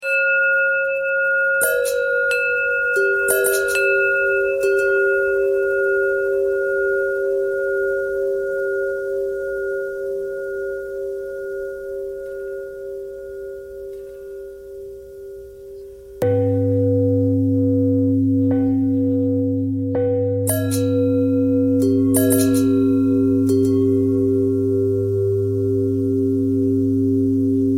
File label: longbell bell bendthread